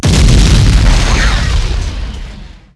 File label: Large Exp, Explosions